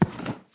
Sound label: concrete, footsteps; Sourceforts2.0